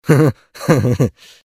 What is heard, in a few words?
laughter; мультяшный смешной смех различных персонажей